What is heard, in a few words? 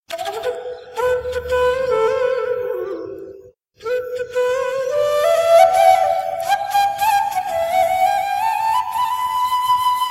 flute bgm; ringtones